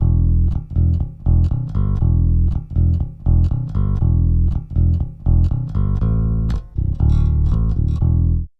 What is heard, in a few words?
Bass - Overtones and Subs; LF Max Punch